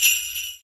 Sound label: sleigh; garrysmod